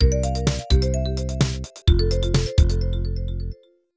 Level Start; Giana Sisters 2

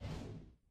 Platforms Transition In; doodle jump 2; android

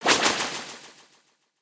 snake death; En kasket snake death; Fight Knight

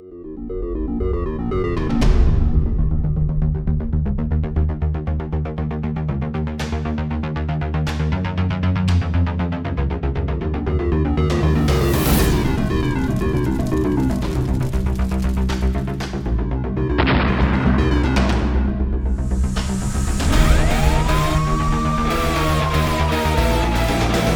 Intro Music; The TakeOver

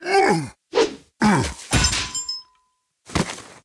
Cyclops Death

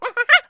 Monkey Idle; angry birds rio

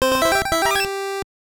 Jingle, gb; quiz sekai wa show by shoubai 1992

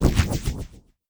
Buzzsaw Spin Jump

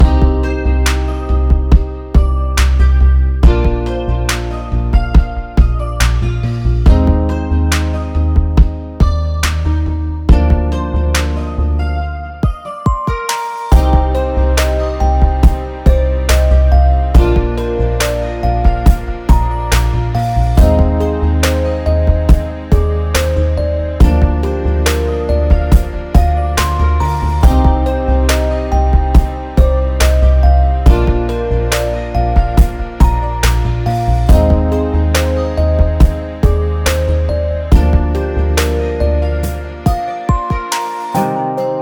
Afternoon (Sad); Daigaku Gurashi

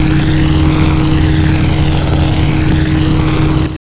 Engine Tun Gear

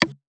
downworld gooddry

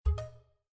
Garden Pop-up Blocked, windows 7

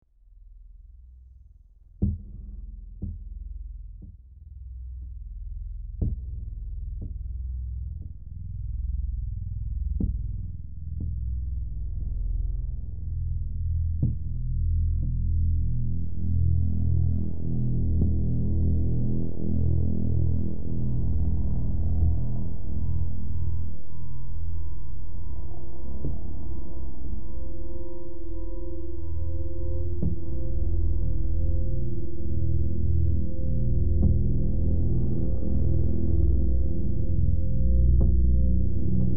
Suspense Loop

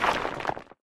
Space Rock Crack; Doodle Jump 2

